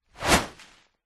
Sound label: foley tree leaves branch whoosh impact designed